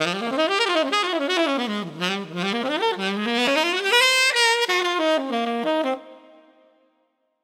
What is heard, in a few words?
12 Beat Dm 90